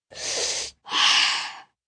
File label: Sigh In And Out, Gasp, Breath, 1 child, inhale, exhale, deep, human voice, kid; Gasping; Voice Box